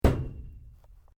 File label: PLASTIC PIPE LARGE STRIKE METAL RING